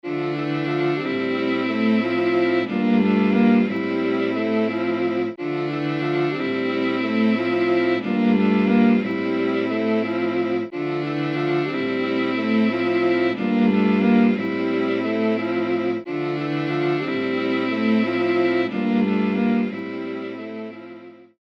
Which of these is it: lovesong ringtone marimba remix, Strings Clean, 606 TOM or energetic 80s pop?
Strings Clean